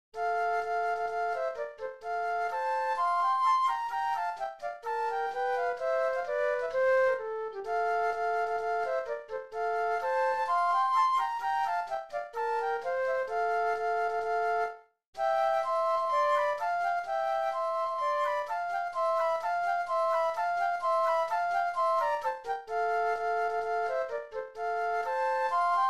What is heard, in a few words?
March Flute Duet; classical; Gurlitt; op. 140 no. 1